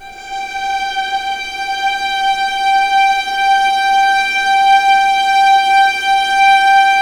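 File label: G3 legato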